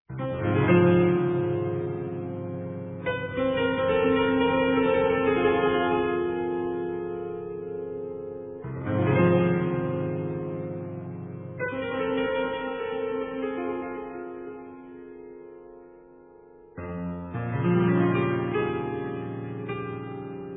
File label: cello and piano; BOHUSLAV MARTINU; Variations